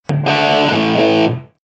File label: Guitar Riff